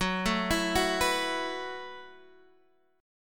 Gb Minor 11th Gb down Guitar Half Step Down; Gbm11

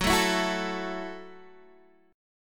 Gb Minor 7th Gb, Guitar Open D

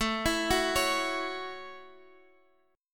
A 6th, Guitar, Drop D

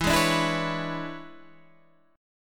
E 13th, Guitar, Standard; strummed; JGuitar

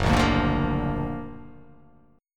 F sharp Augmented Major 7th F sharp

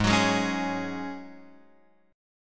Ab 7th Suspended 4th Ab Guitar Open F; Ab7sus4 strummed

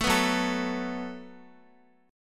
Gb Minor Double Flat 5th, Guitar; Gbmbb5 strummed

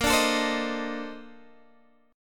Bb Major 11th Bb down Guitar Standard; strummed; JGuitar

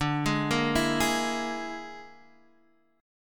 A sharp 7th Flat 5th D

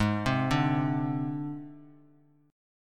G sharp Major Flat 5th, Bass Standard; arpeggiated; x,11,10,7

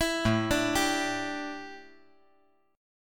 A 7th Suspended 4th, A, down

